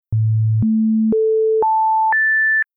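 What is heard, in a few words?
Synth Oscillator Sine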